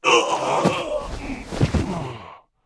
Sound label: dark priest dead, monster